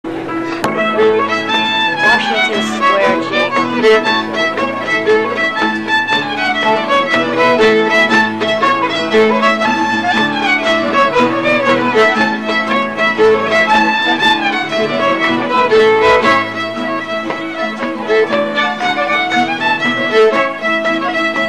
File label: A Jig; Washington Square